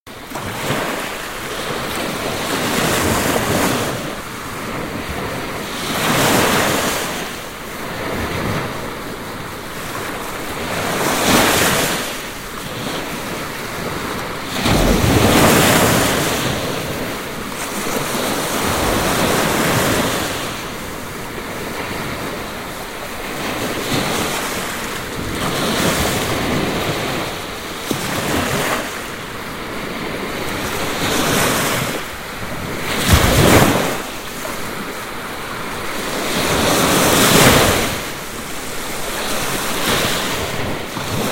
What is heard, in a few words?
Ocean Meditation